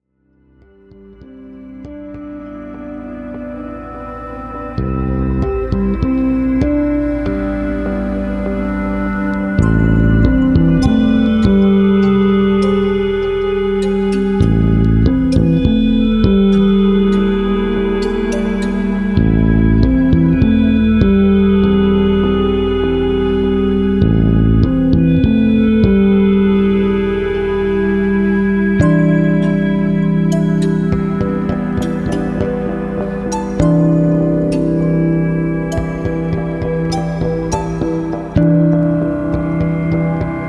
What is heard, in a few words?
Harmonic Ratios